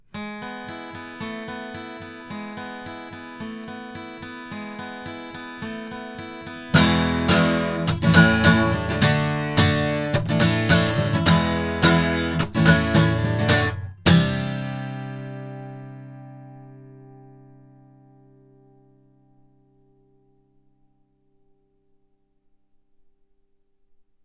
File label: Avant CV-12 tube cardioid guitar